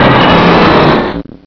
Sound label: Cry; infinitefusion